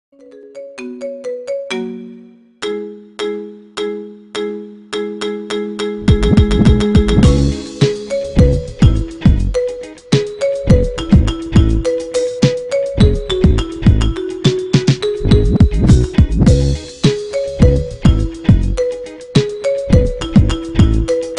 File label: Marimba Remix